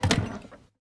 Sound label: Menu Open Container; Custom UI Sounds; Resident Evil 2 (2019)